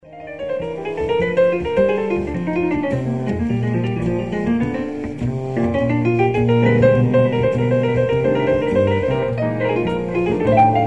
Trio med blues

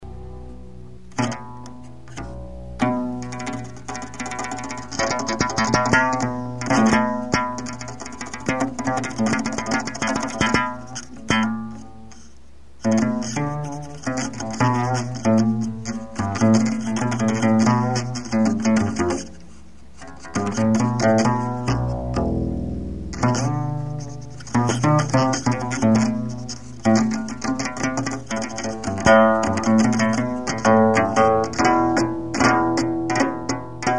bass

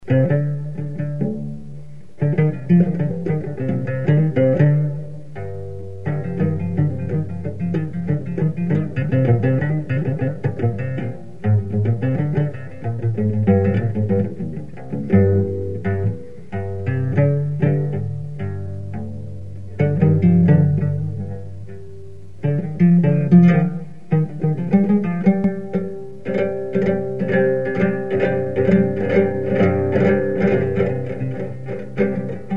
Cello

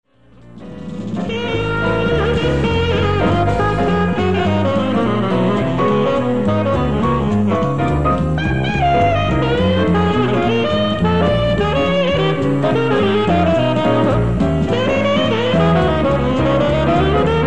Blues

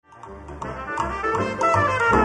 Jazz Me 60

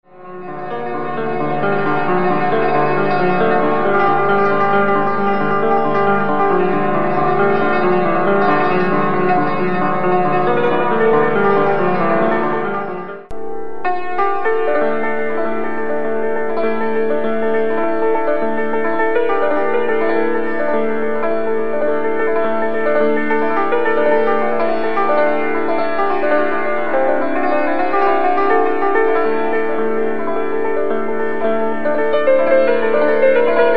Segah piano

